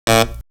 Reed; AMG; Brass n Wind

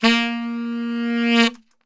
A#1 SAX, Brass Super Section; Best Service

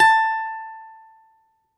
balalaika, Russian Strings; World Combos; E-MU Producer Series Vol. 7 – Old World Instruments

